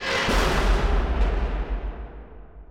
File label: Gate Slam; Gates Of Hell 2